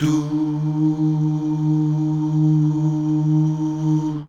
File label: DUUH